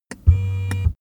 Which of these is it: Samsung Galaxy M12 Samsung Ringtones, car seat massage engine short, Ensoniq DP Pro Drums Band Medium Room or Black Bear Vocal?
car seat massage engine short